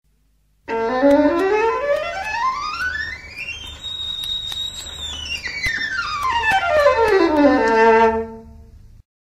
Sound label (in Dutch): Viool van hoog naar laag